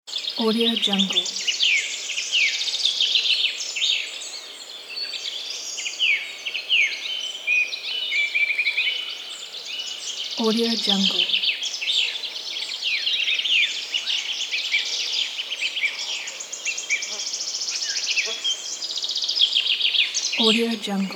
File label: birds